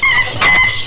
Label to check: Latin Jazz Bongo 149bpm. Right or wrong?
Wrong